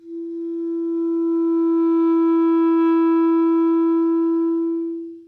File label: F sharp 4, clarinet